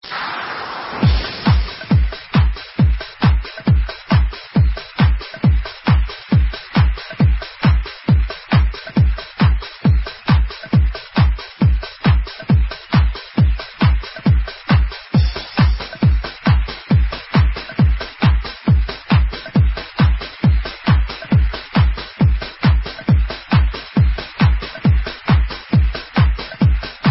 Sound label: Blue Disco